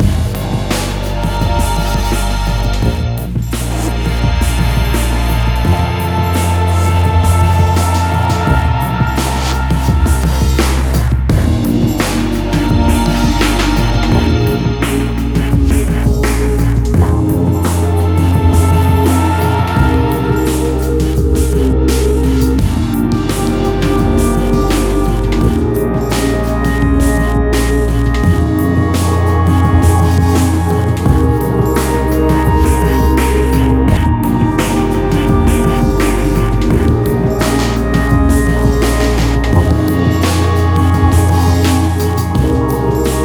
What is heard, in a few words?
music bar; freelancer-hd-edition